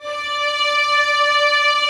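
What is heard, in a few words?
Vio legato D4; Digital Sound Factory Virtuoso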